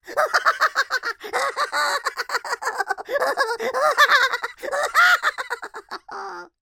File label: cartoon high pitched laughter hysterical funny male